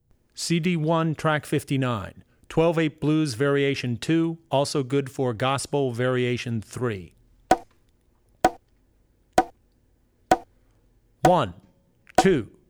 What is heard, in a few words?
12/8 Blues Pattern; 12-8 Blues Pattern (Variation 2); Drummer's Bible Tracks